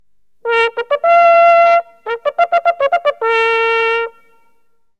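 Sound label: morning at camp bugle and silence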